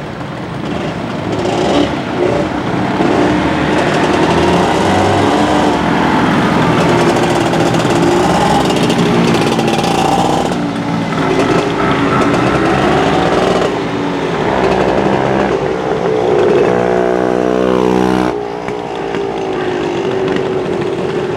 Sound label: Vespa motor